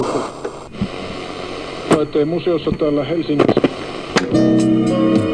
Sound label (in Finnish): radio